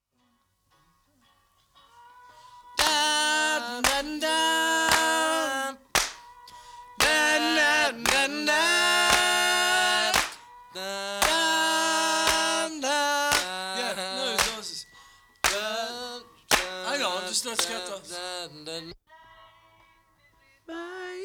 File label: Vocal Overdubs And Speech